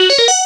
pause sound; konami

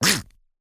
duck quack

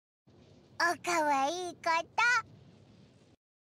kawaii koto; paimon

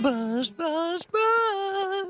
buzz buzz buzz

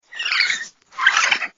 baby dragon babbles

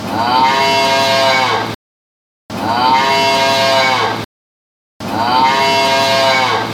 cow moo

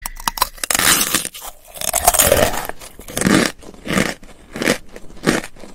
asmr eating candy; among us candy

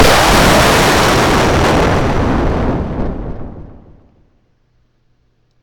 jumpscare; one night with cuphead 2

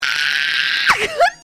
screech

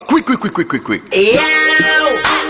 yeoooooooooooooooooooo

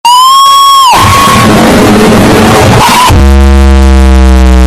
boom; kosho

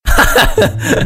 laugh evil; vanoss

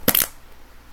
fart

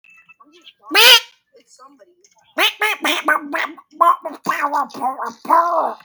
duck quack

